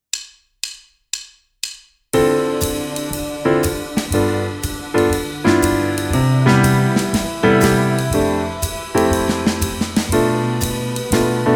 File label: Amin 120